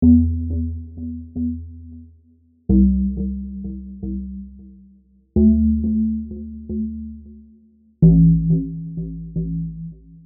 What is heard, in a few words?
bass harm